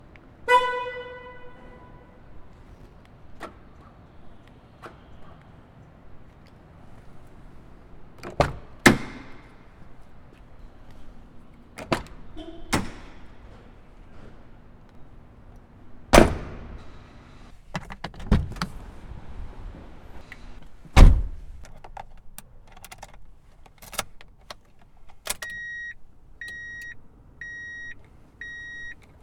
Getting Into Car And Starting It

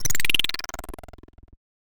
Game Creature

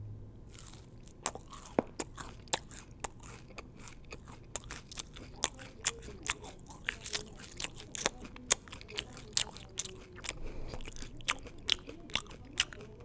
Chewing

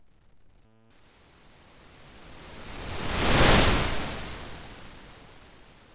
Static Rush

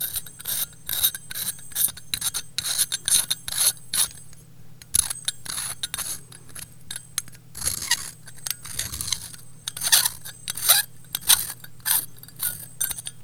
Installing Light Bulb

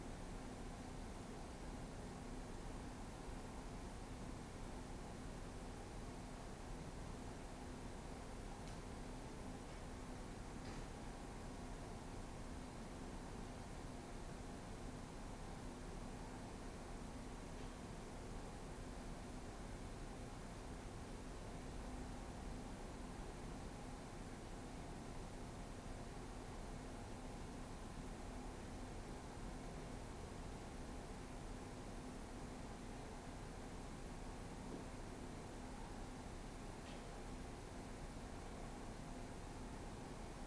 Room Tone

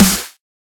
Pendulum Snare

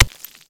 Ice Breaking

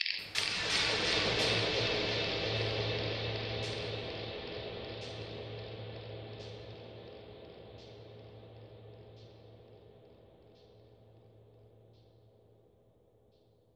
Enormous space

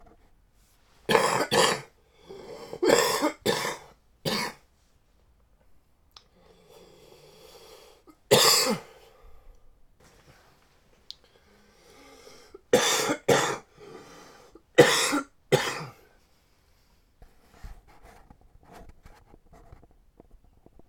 Man Coughing

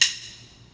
Drumstick

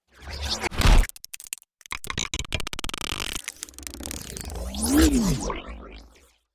Transform And Shape

